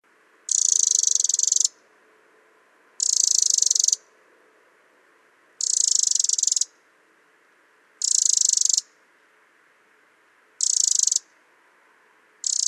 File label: Eurasian Wren Troglodytes troglodytes